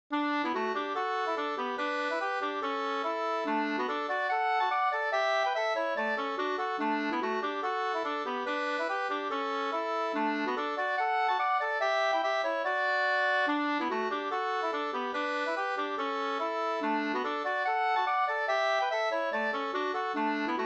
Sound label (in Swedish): schottis; Holm; Hinkes schottis